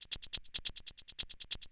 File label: TECHNO PERCUSSION 140